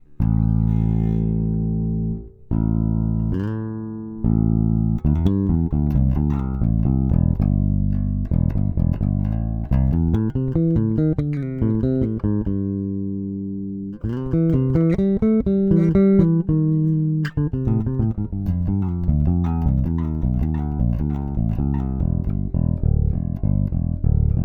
MTD 535 clean

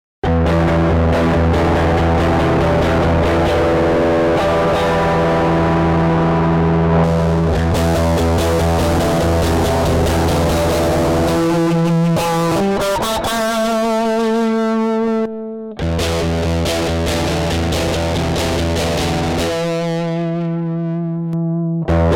Strat Dist. Fuzz

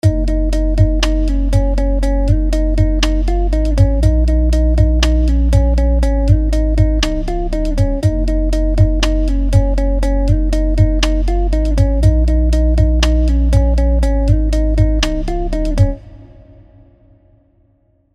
FilterShaper 3 Template 2 Band Split

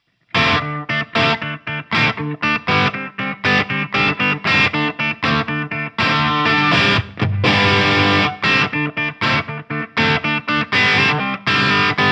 Crunch Bridge Pickup

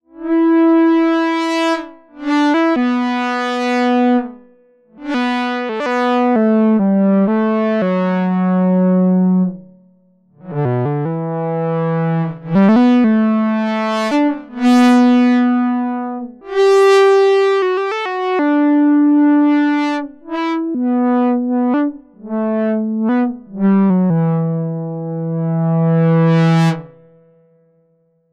ARP2600M Brass Lead

